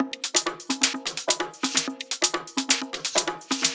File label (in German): Drum Loop 128BPM ohne Clap; VEC5 Drumloops; VEC5 Multi Loops 128BPM